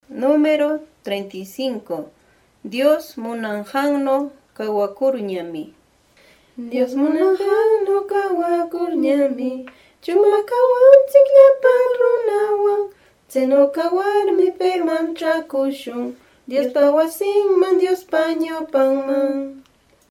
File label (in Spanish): cancion